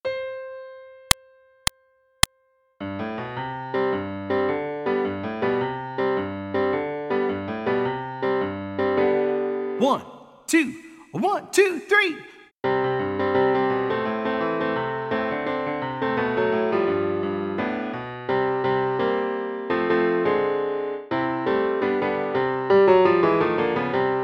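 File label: Tenor Accompaniment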